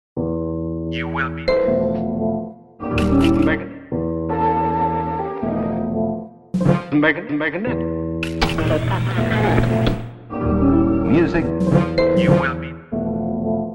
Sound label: Ominous